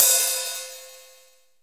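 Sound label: Roland R-8 open hi-hat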